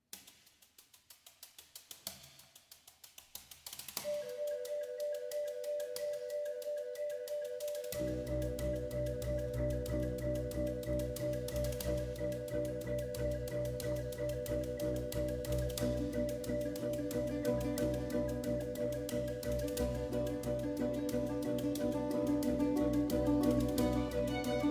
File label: Larghetto, Concerto for 4 Violins and Cello in B Minor